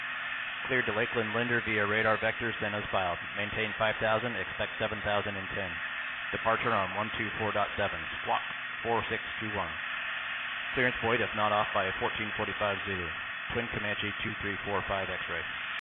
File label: Piper Twin DC One X; Zulu 4; sound comparison